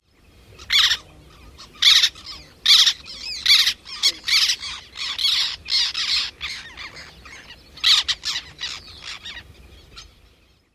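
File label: Black Tern more calls at nest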